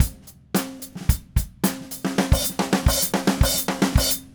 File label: Red Maple Groove 110, DW Red Maple Kit